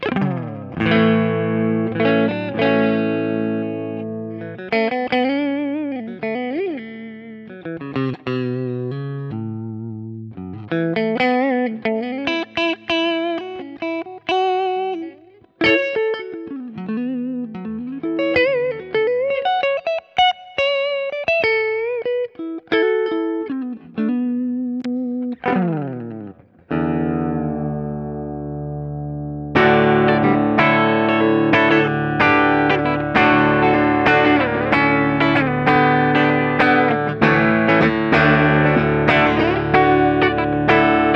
Ibanez AZS2200 Prestige Neck to Bridge Alternate Switch up